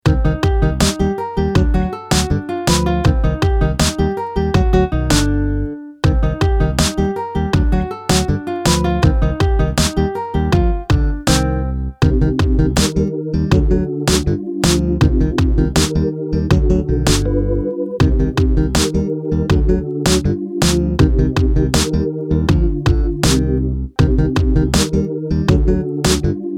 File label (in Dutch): Het gaat weer beginnen instrumentaal